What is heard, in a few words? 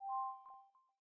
Voice processing; Samsung; Galaxy Core 2